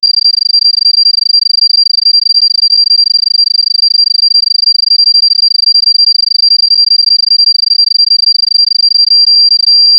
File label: text show from left to